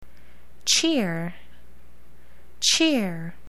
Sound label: cheer